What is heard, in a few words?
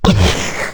maggot fires; pestilence